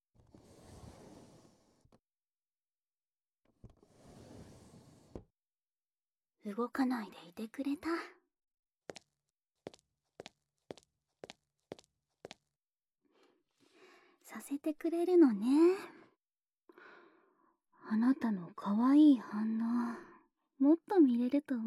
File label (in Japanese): 個室に入って綿棒で耳掃除～右側